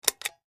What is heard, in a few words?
Push Button Plastic